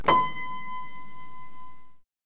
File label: Piano